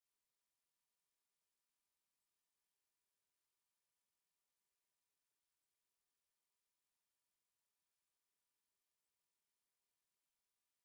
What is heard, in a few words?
12000hz sound